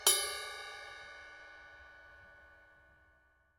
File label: 19in A Medium Thin Crash REV, cymbal sound